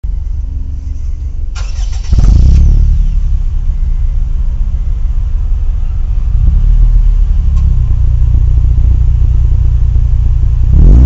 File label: silencer, car; fit; mugen